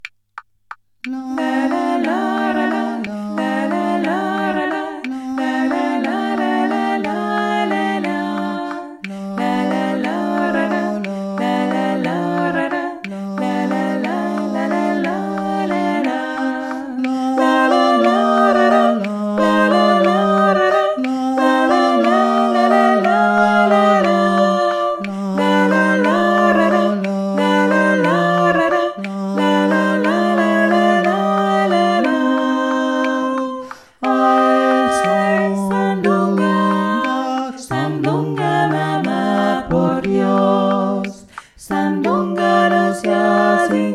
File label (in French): La sandunga voix + clic; tutti; soprano; alto; homme; (Tehuantepec)